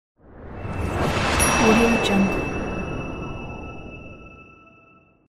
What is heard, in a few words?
christmas whoosh